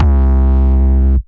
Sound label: 808's